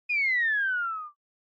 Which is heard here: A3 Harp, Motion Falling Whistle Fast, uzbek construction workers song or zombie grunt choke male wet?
Motion Falling Whistle Fast